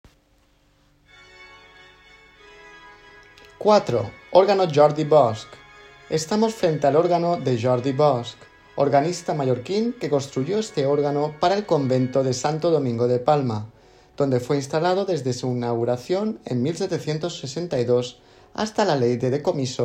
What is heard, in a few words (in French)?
Orgue